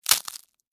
Crack Crunch Short, Texture, Crack, Crunch, Short, Ice, Cream, Cone; Food